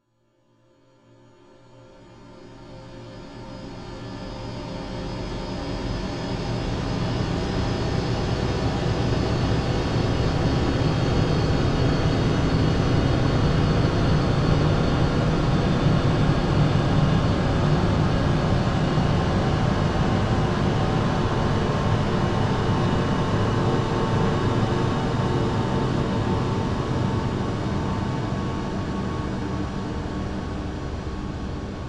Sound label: sound design horror drone good for realisation of bad situation etc